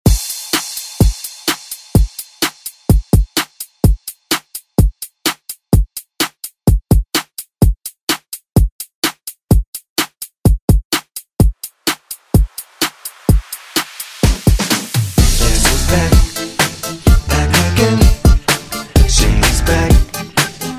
Re Drum; DJ Mhark

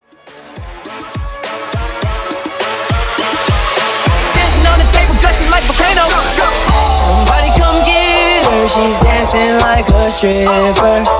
Twerk 103 BPM

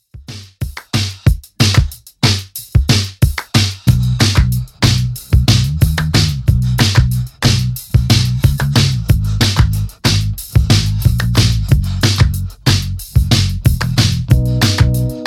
80s Redrum 92bpm

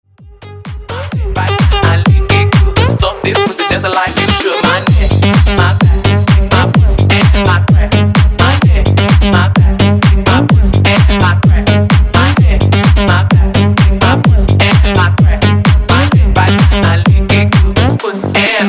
Funk Transition 128 126Bpm

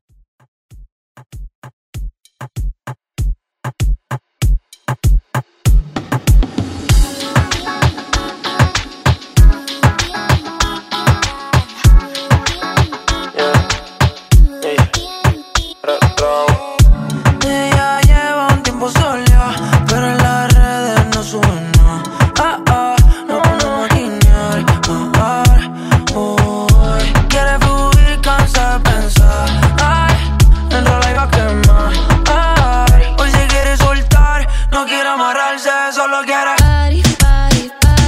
Reggaeton Redrum

